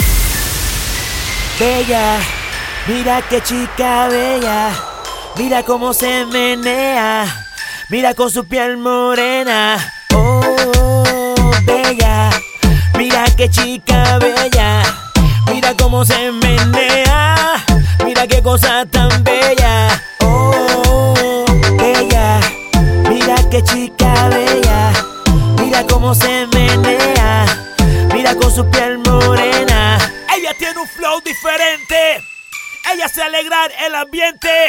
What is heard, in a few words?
Full Loop 95BPM, F